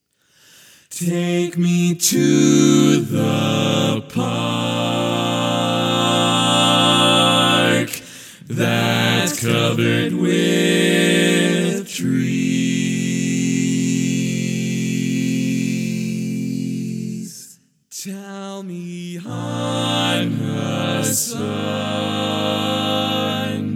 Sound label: barbershop tags, all parts